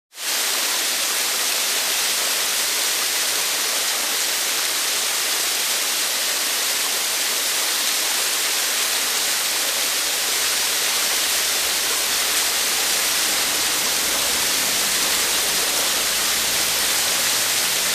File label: Waterfall Small Mist